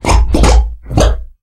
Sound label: Concrete Pour; Prison Architect